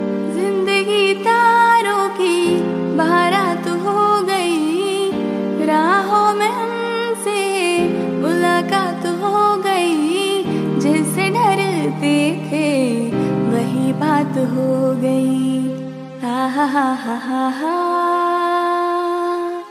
hindi love ringtone; bollywood ringtones

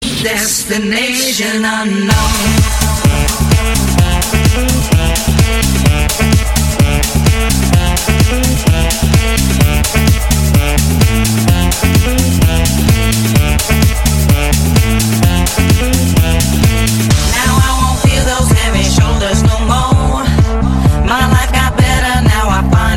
disco music song tone ringtone

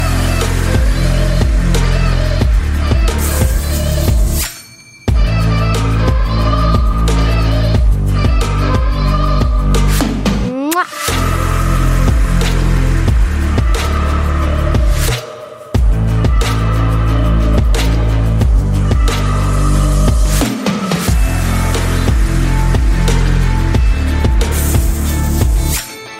english music

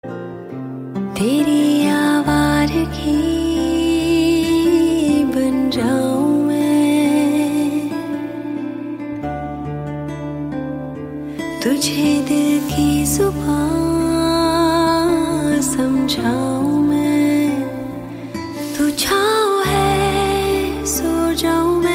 romantic hindi song tone ringtone; bollywood

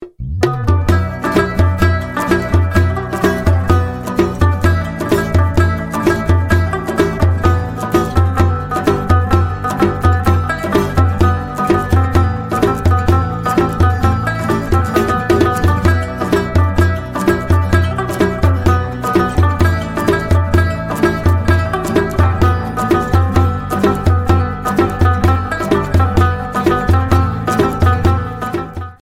rabab ringtone

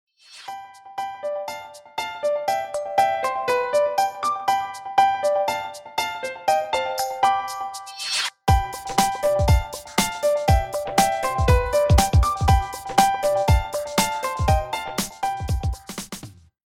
piano ringtone; iphone 11 pro

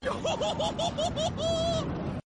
funny laugh background music tone

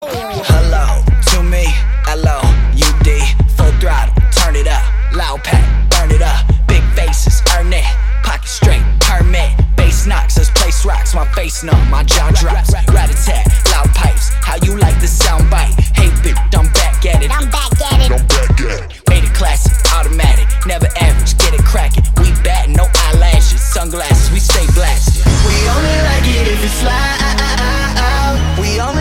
loud ringtone

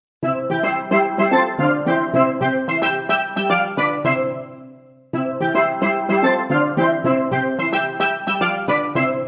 cartoonic music call alert tone ringtone

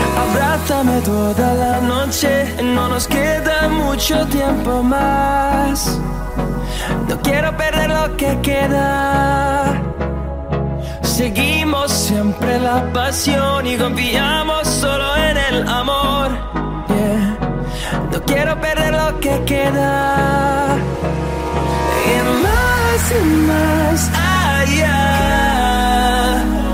english remix ringtone

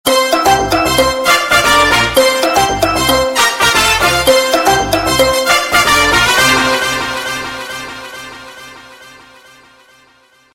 khoobsurat sms ton ringtone

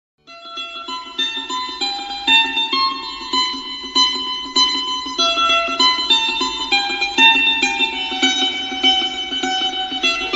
guitar ringtone

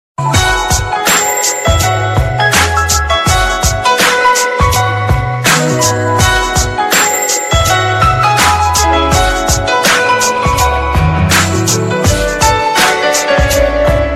sad love piano ringtone

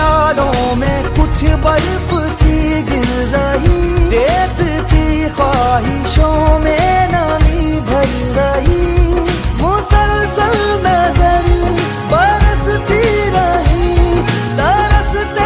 sweet music ringtone

